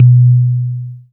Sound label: BAS TX-802; Best Service Gigapack 2